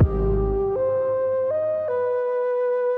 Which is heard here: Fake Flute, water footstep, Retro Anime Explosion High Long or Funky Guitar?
Fake Flute